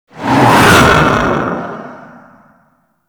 Fighter Ship Close Short Fly By, Space Fighter Ship Engine; Warframe; RailJack; Enemy Ships; Grineer